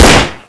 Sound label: GLOCK